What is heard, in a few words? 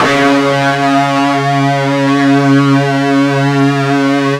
FUZZ C#2, ULTRA FUZZ, Guitar; East West; Steve Stevens Guitar Samples Collection